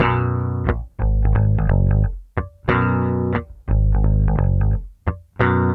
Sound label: BASS, Loops; East West